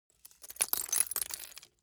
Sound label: object glass debris fall single